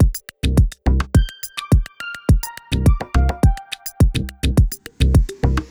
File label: Kinda Techy, Loops; BB 2HTC Samples Vol 4